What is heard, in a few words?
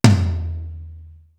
POWER TOM; PROC.TOMS; East West; Global Sounds